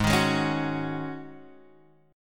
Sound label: G sharp 7th Suspended 4th, Guitar, Half Step Down